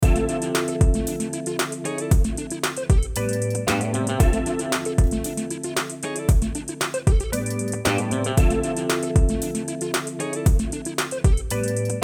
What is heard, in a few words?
No Bass